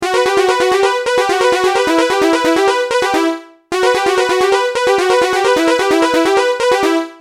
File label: fast seq A dry; x5dr